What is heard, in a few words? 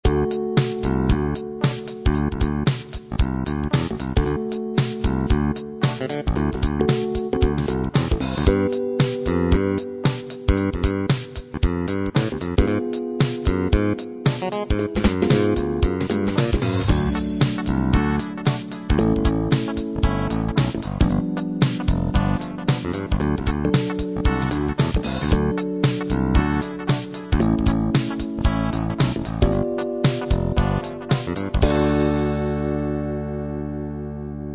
funk hiphop